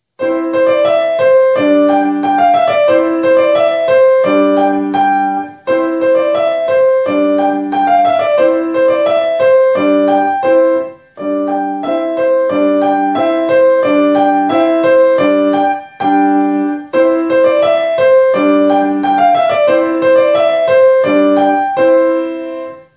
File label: trumpet tune; gurlitt cornelius